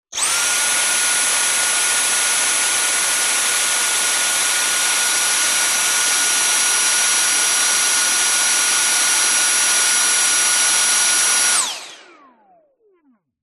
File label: household vacuum cleaner stick med burst close up motor; dyson v7